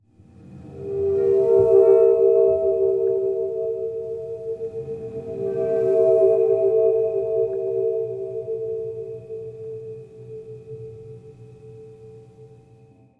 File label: Train